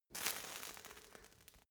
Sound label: fire torch sizzle tiny